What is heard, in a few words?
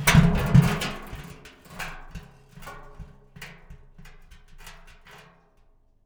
bucket falls over, rust kit; dry; Nucleus Soundlab; Ambient Drums